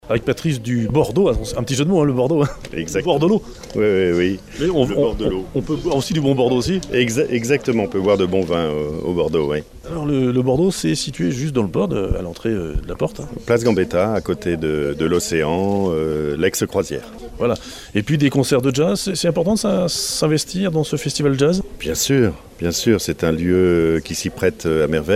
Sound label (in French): jazz bord eau; vannes